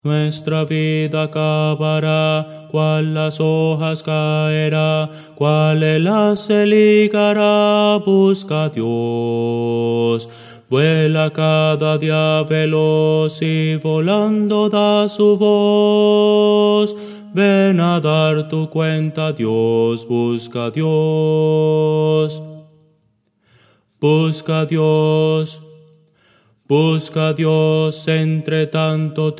bajo, himnos cristianos

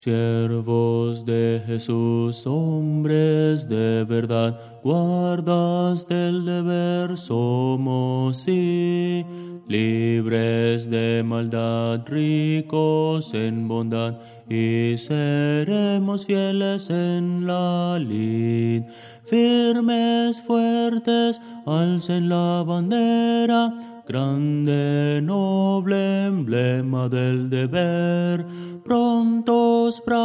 bajo

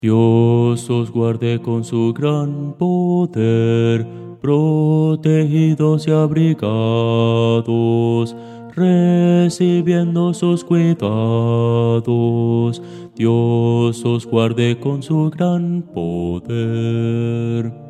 bajo

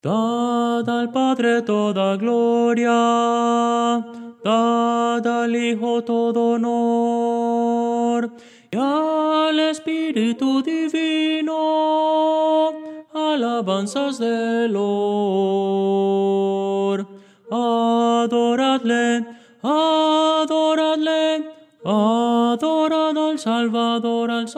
tenor

